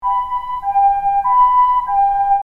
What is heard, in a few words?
ambulance loop